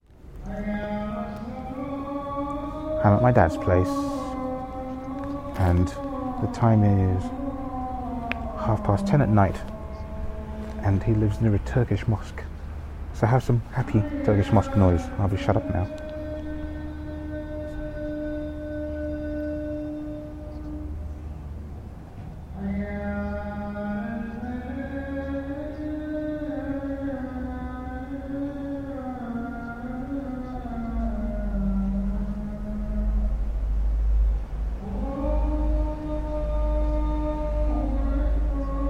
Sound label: Sounds of a Turkish Mosque in London